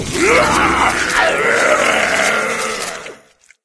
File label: BLARYEUGH